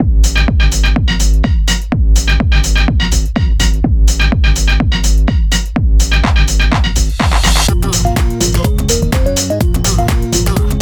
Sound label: Tech House; London